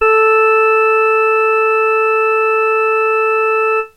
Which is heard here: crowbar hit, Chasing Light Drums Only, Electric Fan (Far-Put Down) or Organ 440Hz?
Organ 440Hz